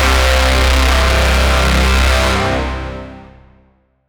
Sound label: futuristic sci fi braaam